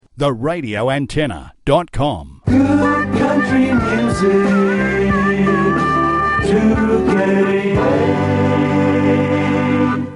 Aircheck country JINGLE

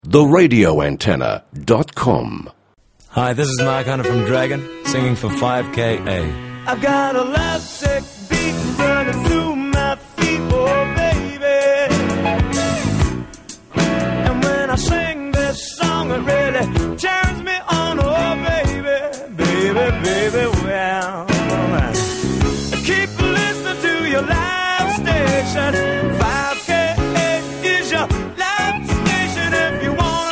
jingle; Aircheck; 5 KA; Life Station

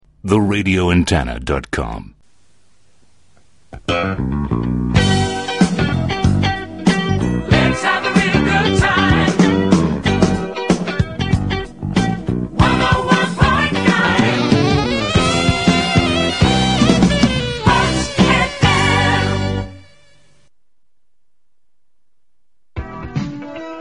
FM jingles; Aircheck; 3FOX FM; 1980

